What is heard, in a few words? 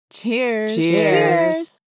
cheers